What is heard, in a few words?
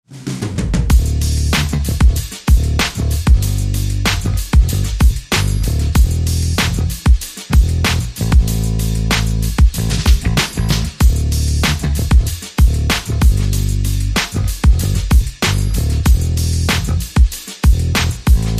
Drums and Bass Only